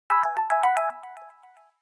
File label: A toy watch; Samsung Galaxy Pocket